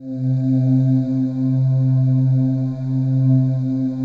PAD, ATMO PADS; Best Service; XX Large

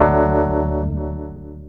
RHODES; E-Lab; X Static Goldmine 2

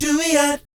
DOOEEOT, Vocal Jazz Fx

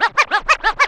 SCRAT; E-Lab; X Static Goldmine 2; akai

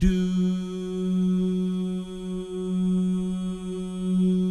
"doo" guys, Vocal Jazz Fx

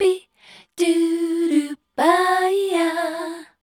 MEL F#, Voice Spectral

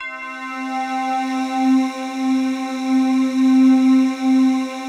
PAD, ATMO PADS; Best Service; XX Large

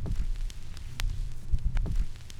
CRACK; E-Lab; X Static Goldmine 2; akai

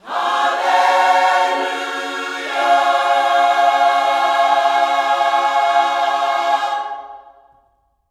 HALLELU, Gospel

